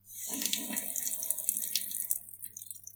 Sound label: Water